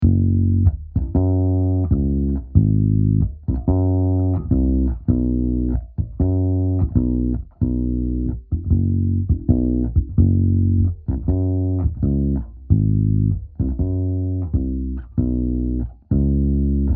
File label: bass amp